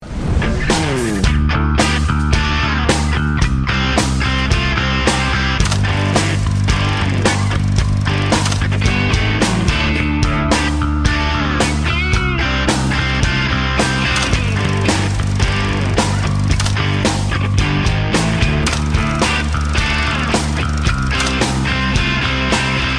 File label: Desert Car